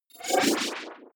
wobble rise short

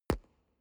explosion bass; hits-explosionen

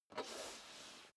step dress boots metal dry slide long; schritte; Footsteps; menschen

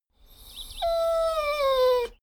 dogo argentino whine squeak; natur-tiere; hunde

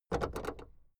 car camaro open trunklid short

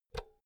power cord insert hard; objekte; elektronik

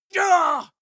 male combat hit soft; soundpool

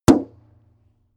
metal impact bright muffled

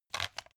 pepper mill; soundpool

